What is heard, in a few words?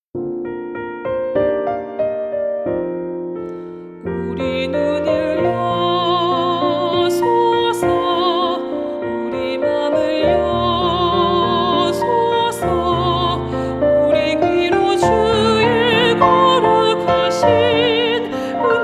TENOR